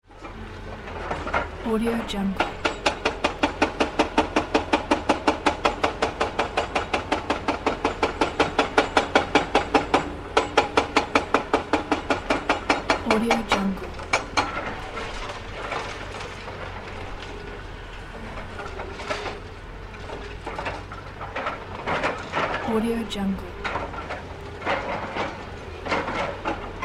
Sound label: construction site jackhammer on concrete